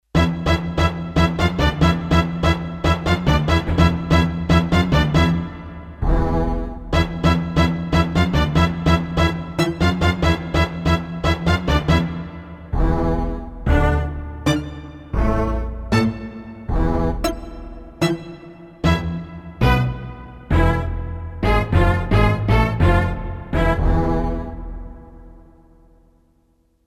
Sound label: Orchestra Hit, Roland D-10; Factory Patches